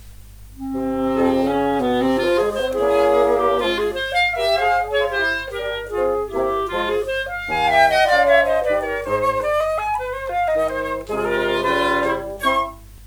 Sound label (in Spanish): Danza Cubana; Cervantes - 5 Danzas Cubanas (Fl, Cl, 2Bsn); Te Quiero Tantom